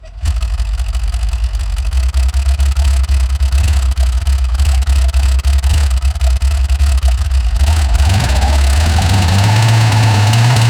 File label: v16 diesel with, automotive